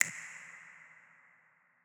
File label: Snap Smooth; Audio Toolkit